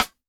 chillhouse snare; Electro

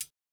hihat, house

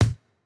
Jrock kick

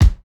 kick, house

